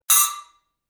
Choke

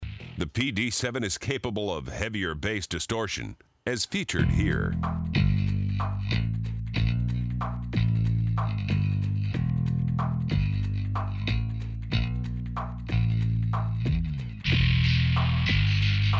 heavy distortion

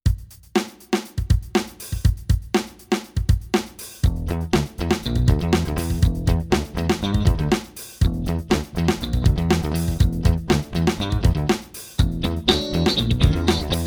funk

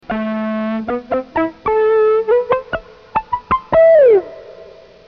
bow spiccato

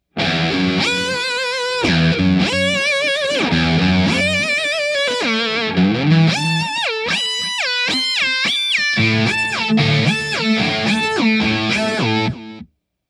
Octave Up Pitch

